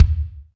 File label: DM5 Kick, Alesis DM5 drum machine